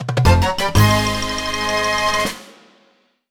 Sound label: Fanfare Rare Item; "Get Rare Item (treasure)" fanfare; zelda